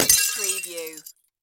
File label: Crash Window Pane